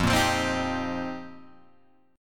F 7th Suspended 2nd Suspended 4th, Guitar Open G

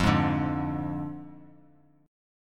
F Minor 6th F down; Fm6; strummed; JGuitar

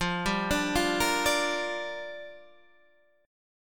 G Minor 7th, F

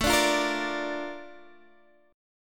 Bb Major A, chord; Bb/A; strummed

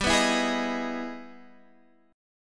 E Major 7th G, chord; strummed; Emaj7/G; JGuitar

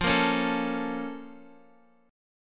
Bb Minor Major 7th

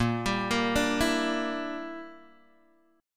A sharp 6th Flat 5th, Guitar DADGAD

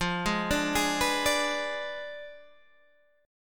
F Diminished 7th F down Guitar Open G

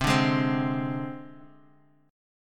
C Suspended 2nd Sharp 5th, down; Csus2#5 strummed; JGuitar

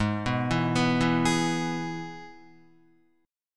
Ab Minor Sharp 5th chord, Guitar; arpeggiated; 4F,4A,5C,5G,5C,6E; 3,2,4,4,4,4

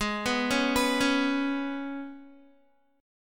G sharp Minor Double Flat 5th G sharp, Guitar; G#mbb5 arpeggiated

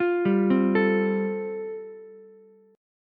G 9th Suspended 4th, Ukulele, C6; G9sus4 arpeggiated

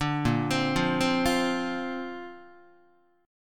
A sharp Major Add 9th, Guitar; arpeggiated

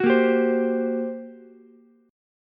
G Minor Major 11th G, Ukulele, C6; strummed; 11,10,15,15

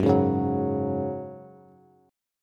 Gb Suspended 2nd, Bass; strummed

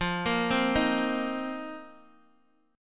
F 7th Suspended 4th, Guitar, DADGAD

